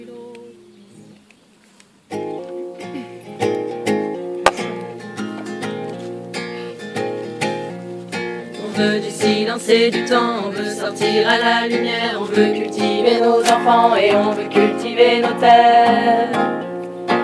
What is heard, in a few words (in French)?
deux voix; Notre Dame Des Landes